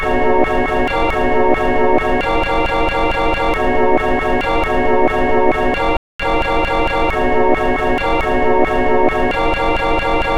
Sinewave

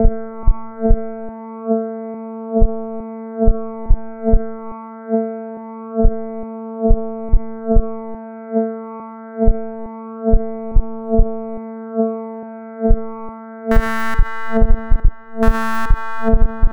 sine wave

